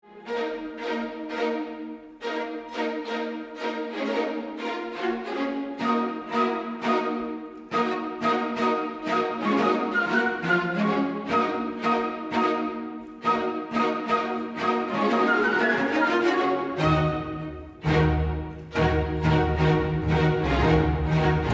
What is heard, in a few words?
ORCHESTRAL STEM Chords Pulsar Ultra; North Base